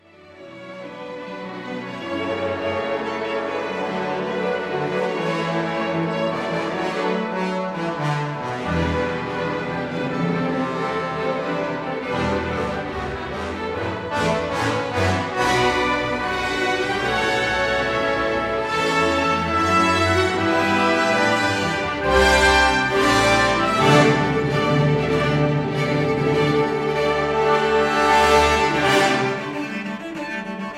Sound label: cello concerto